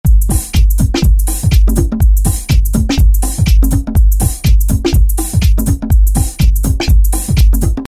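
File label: drum-Randhous; E-mu Orbit V2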